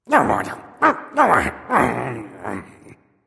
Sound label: grumbling; smite